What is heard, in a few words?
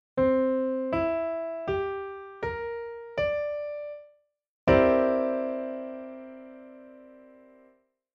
chord pf 7+9